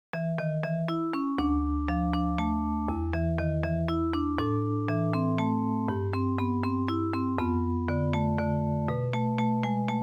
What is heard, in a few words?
elegant waltz